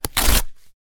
Cardboard Tear

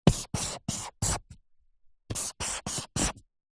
office marker draw squares whiteboard

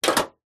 household bbq tongs set down on metal bbq; zapsplat

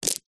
necklace small stones pebbles set down carpet

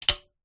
sport tennis racket string pluck pick finger; zapsplat

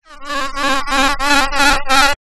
science fiction robotic fly bee fly close; zapsplat

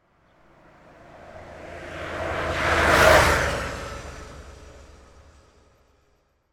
transport motorcycle pass at speed

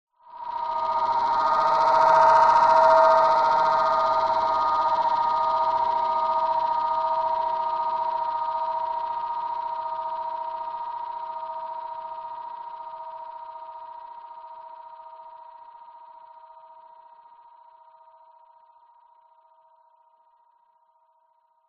fm buzzing shrill mid range delayed; sound design

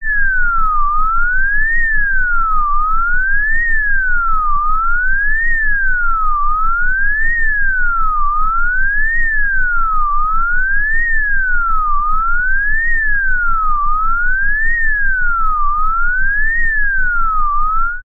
emergency alarm siren high pitched med slow; zapsplat

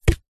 leisure boomerang wooden catch single hand

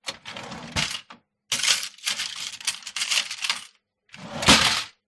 pub cash register till open close coins; field reports

